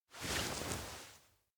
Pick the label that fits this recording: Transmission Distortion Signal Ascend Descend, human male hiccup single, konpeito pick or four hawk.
konpeito pick